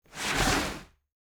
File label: Sliding